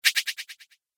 Vanishing Whoosh; Whoosh Sound Effects; sound design

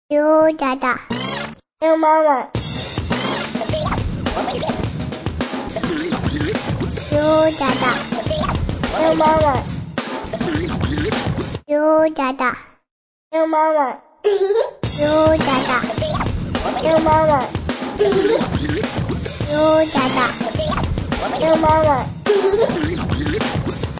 Baby DJ Hip Hop; Ringtone; Music Ringtone; Musical

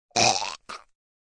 Gag Choke, A gagging choke; Gagging (Sound Effects:Voice)

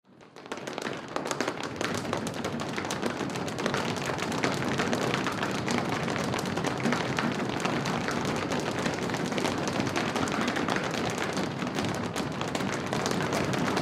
Human Crowd Studio; Crowd Reactions Feet Stomp Multiple Long; Sound Effects:Voice

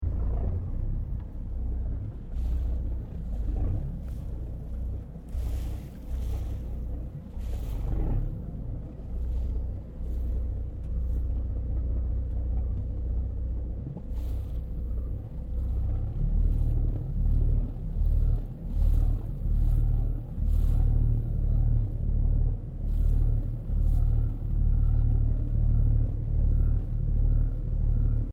Boat Tourist Ferry Idling Waves

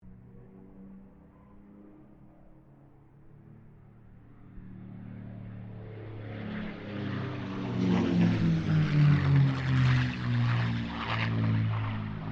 AIRCRAFT CA-18 MUSTANG PASS DISTANT; P51 /CAC-18 Mustang; Airplanes; Vehicles; Aircraft Collection; Twistedtracks

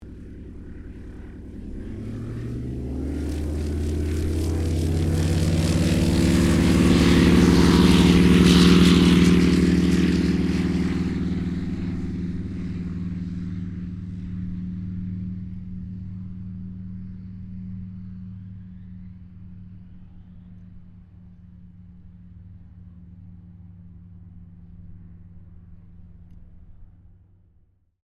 AIRCRAFT CA-13 BOOMERANG TAKEOFF; Airplanes (Sound Effects:Vehicles)

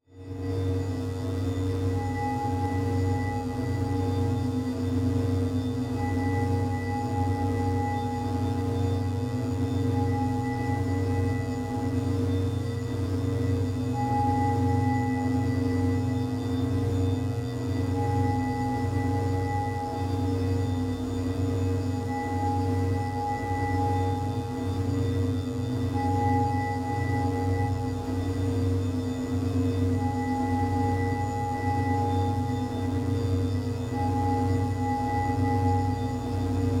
Drone Crystal Feedback Alarm Pulse; 5.1 STEREO MIX; Blastwave

